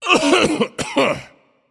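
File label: non verbal clear throat, voice; cernunnos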